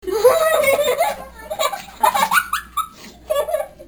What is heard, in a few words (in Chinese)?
女孩笑声; renlei